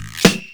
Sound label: GUIRO SNARE; Ragga & Dub Reggae; AKAI; USB Soundscan vol.27; FILLACOUS